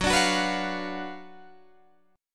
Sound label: F sharp Major 7th Suspended 4th Sharp 5th, F sharp, down, Guitar, Open G